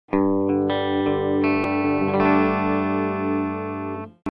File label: Electro-Voice Cobalt7 Clean (Bridge), guitar